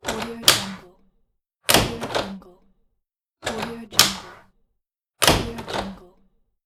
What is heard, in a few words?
door open and close